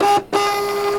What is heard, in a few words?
printer; Golden Crayon Sounds